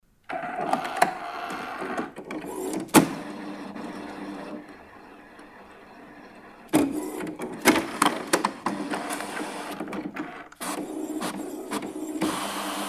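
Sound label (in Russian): печать принтера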